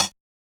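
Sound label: Hi-Hat FX Open; Yamaha DJX-2B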